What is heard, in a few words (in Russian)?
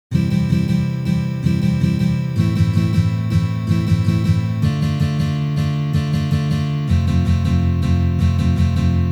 Valsovyj boj na gitare